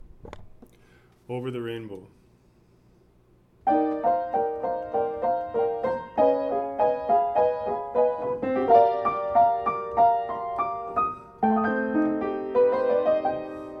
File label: soprano, choir